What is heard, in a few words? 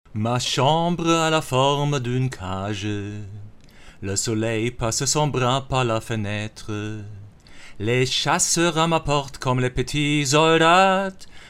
t.bone SCT2000 Vocals